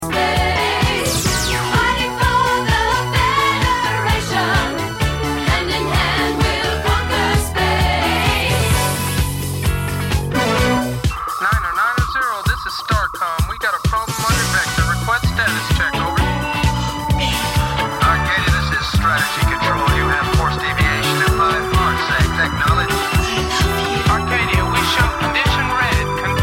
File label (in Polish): Disco Przeboje